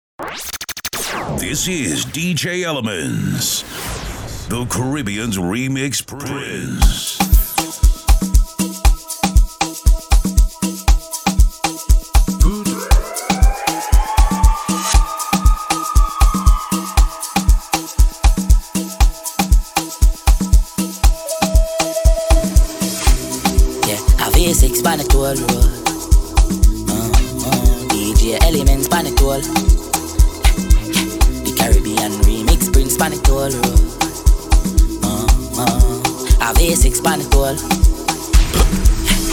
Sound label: amapiano mix; amapiano mixtape 2024 amapiano remixes dancehall